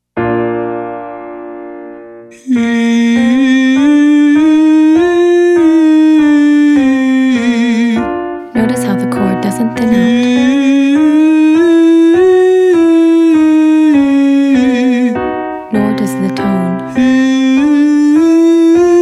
Exercise Hee male; Singing

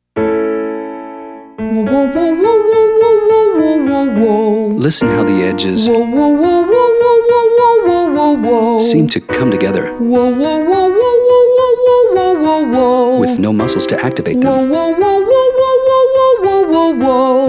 Exercise wohw female

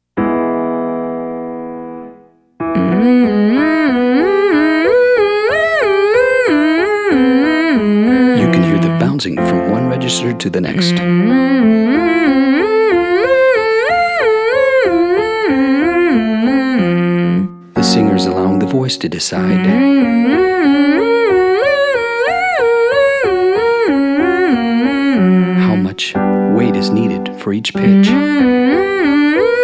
hum mixed scale female; Singing

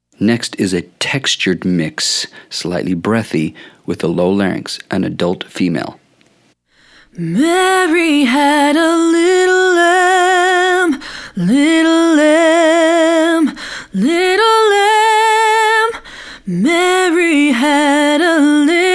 Mix Examples female; Exercise 7; singing lesson

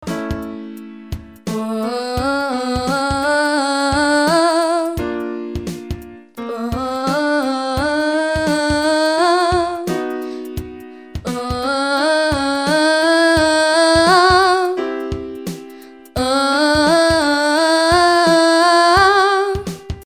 Triplets up 5 tone scale

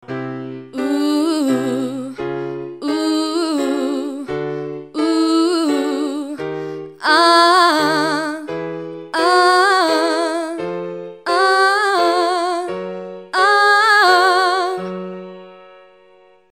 Exercise Note bend fall off

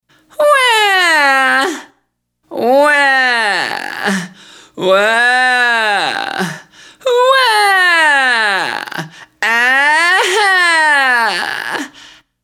Exercise 6 crying male; Singing

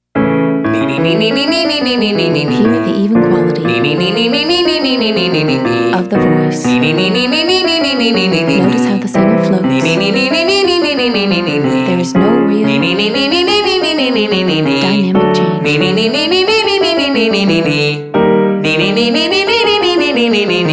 Exercise 7 Nee male; Singing

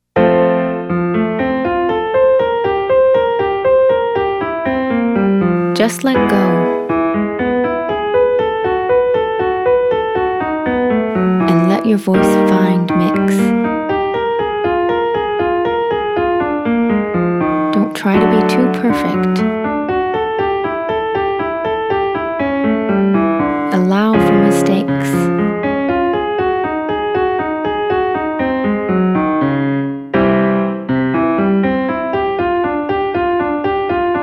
Exercise Your turn Male; singing lesson

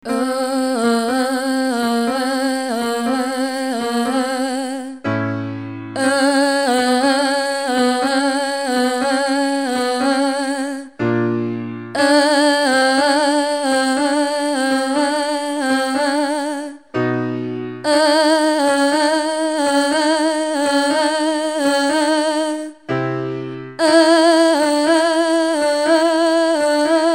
Note Bend Down

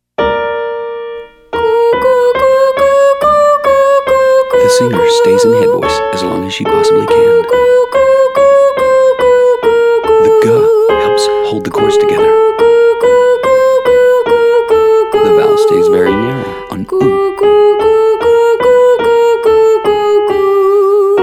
Goo Goo Goo Female Male; Singing

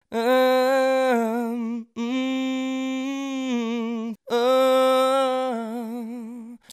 Bends are trills

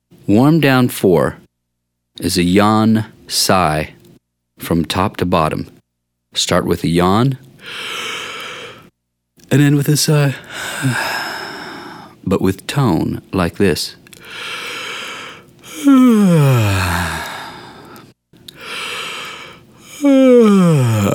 warmdown Yawn males

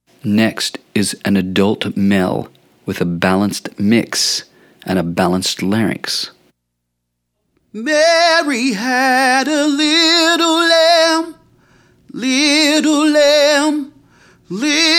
Exercise Mix Examples male; singing lesson

